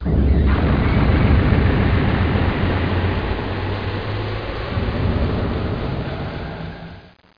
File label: Spaceship Takeoff Small; Let's Discover Pennsylvania!